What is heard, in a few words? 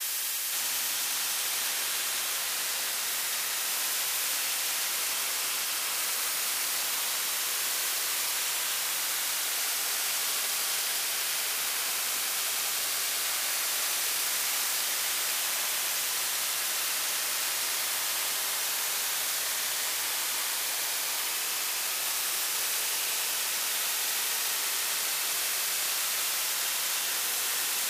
Transistor Elect Noise, communications